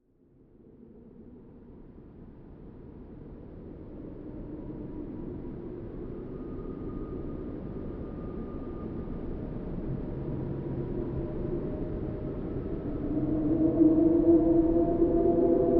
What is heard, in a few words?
Horror Ambience